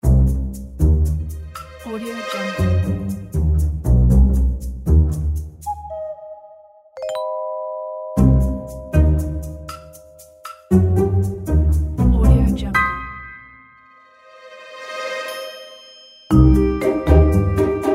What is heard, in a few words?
detective